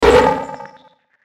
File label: Hit High Warble; sound design; Blastwave